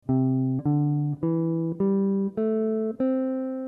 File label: major penta